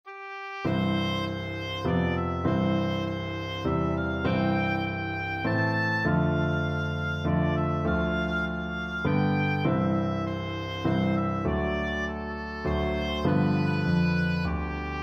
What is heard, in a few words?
away in a manger, oboe